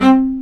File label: STR, Vc Marcato; String Master Series; Vc Marc&Harm; Vc Marcato 1